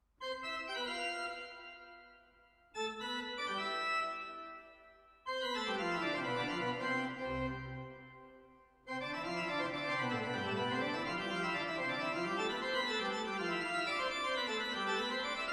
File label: mictest organ, microphone comparison cardioid